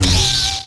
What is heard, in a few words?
lightsaber hit Human; weapons